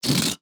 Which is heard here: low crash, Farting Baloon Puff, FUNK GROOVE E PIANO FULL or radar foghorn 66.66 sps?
Farting Baloon Puff